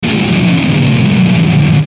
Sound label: Distortion Descend Buzz; Distortion Descend Low Buzz; Blastwave